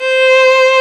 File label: ARCO VIOLIN, Arco Solo Violin; E-MU Producer Series Vol. 2 – More Studio Essentials